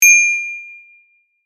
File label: bell correct